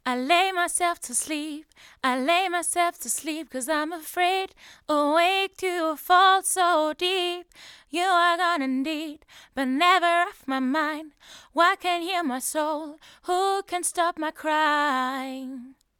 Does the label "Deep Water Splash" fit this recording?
No